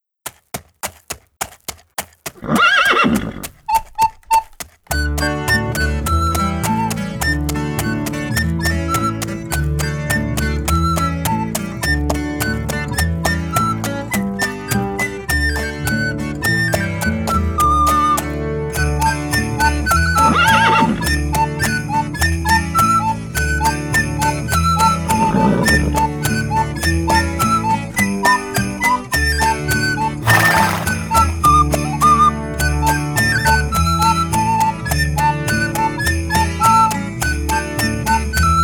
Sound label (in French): Le cheval qui trotte instrumental